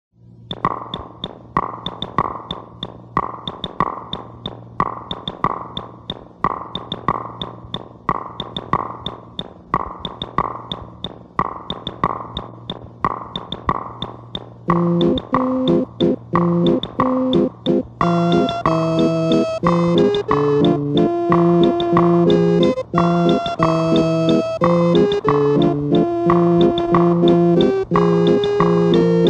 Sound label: improv 5 4